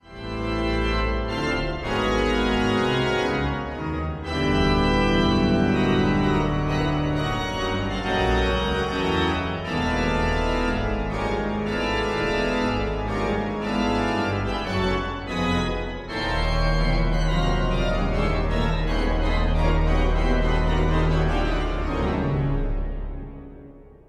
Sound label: Organ; The King of Instruments